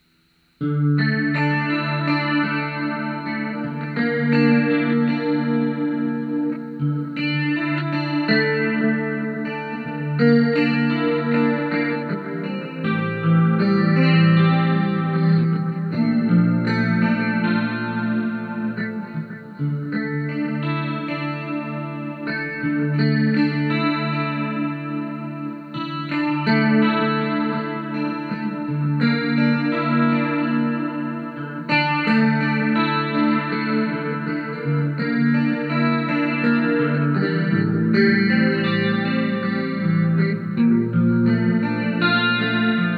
Heavy: Depth at 4pm, Rate at 1pm, Width 11am, chorus, guitar; With this clip I wanted to see how well it played with both delay and reverb; it plays quite nicely